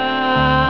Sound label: ahhhhh